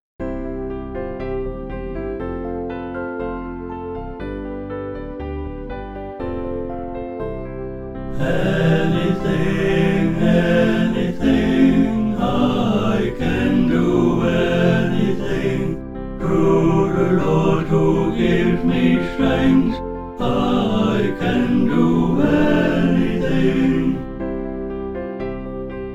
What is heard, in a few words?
SATB; New Hope Music